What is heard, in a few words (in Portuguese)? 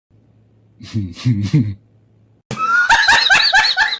risada